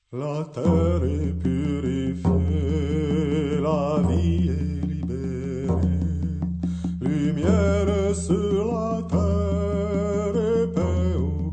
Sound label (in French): Chant de la loge a transpirer